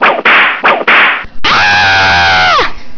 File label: whip scream